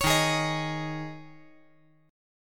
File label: D sharp 7th Suspended 4th